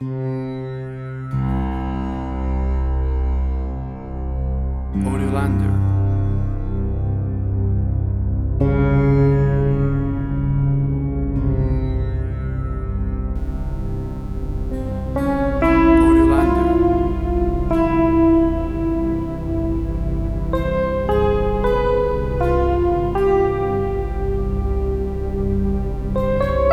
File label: Arabic Ambience Full Mix